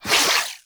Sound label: Beam Retract; Warframe; Corpus; Glaxion Beam Moa